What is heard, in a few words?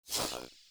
UI Corpus Theme Window Close; DE; Warframe